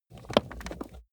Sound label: plastic plate crevice click